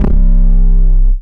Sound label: DM BAS, Dance Mega Synth; Best Service